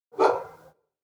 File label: Barking Dog; SES Animals SFX